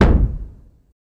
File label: Staff Hit Single, spears & staffs